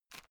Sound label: headphone extend single short